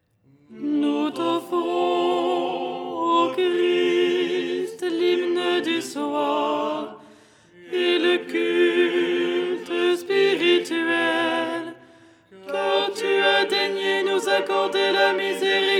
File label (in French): alto